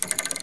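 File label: Plunger, pinball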